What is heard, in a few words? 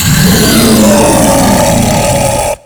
Infested Ancient Taunt Scream; Warframe